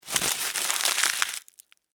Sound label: Foley Tin Foil Crumple; Crunches (Sound Effects:Objects)